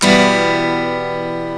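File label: Acoustic Guitar Minor Chords, E minor up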